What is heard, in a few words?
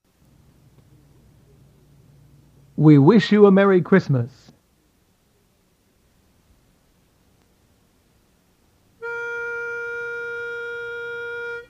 Baritone, Chorus; Wish You a Merry Christmas